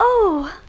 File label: "oh"